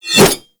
Tonfa Whoosh; Warframe; Tenno

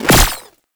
Gunbrella Bullet Deflect; Weapons; Warframe; Tenno; Koumei

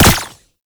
gunbrella bullet deflect; Koumei

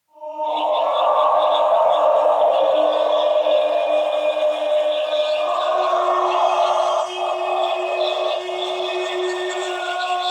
Choir; Warframe; Sentients

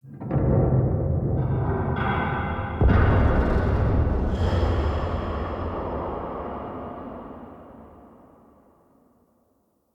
Stone Puzzle Activate Far; Puzzles

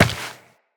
Step Rock, Footsteps, Walk; Tenno

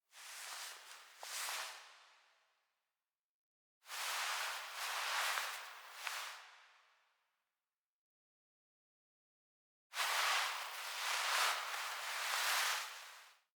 Cloth, Foley; Ballas; Warframe; Cinematics; Apostasy

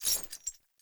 Melee Swing Chains; Characters; Enemy; Foley; Sinecure; Melee Grunt

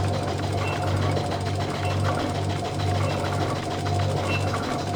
Ceiling Fan Loop; Warframe; Ambience; Grineer; Grn Fans

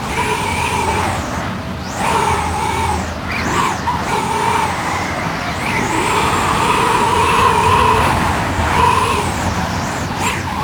Ice Storm Wind Loop; Warframe; Frost

